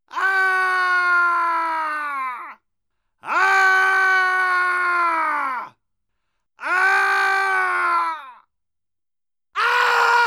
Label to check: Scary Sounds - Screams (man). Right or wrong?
Right